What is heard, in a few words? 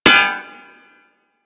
Melee Hammer Impact Generic